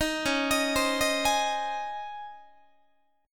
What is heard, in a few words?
Db Major 7th Suspended 2nd Db Guitar; 18,21,18,22,19,19; DbM7sus2 arpeggiated; JGuitar